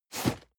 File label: cardboard box medium slide short hard; objekte